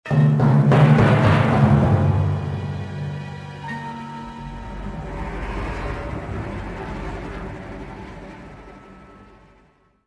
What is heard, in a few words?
swamp, music; Star Wars Jedi Knight I Jedi Academy; XBOX; yavin